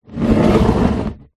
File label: plastic tub box large drag slide smooth concrete ground; foley